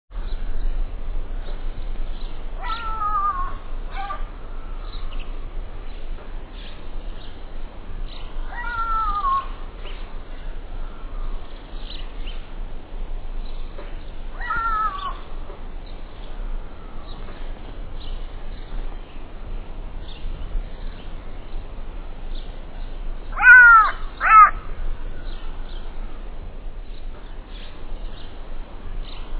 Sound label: Jackal distant barking howl with grunts